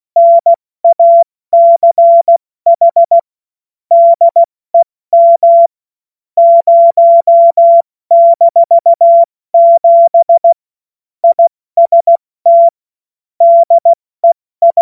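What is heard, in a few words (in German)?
Witz, cw morsen; Morsekurs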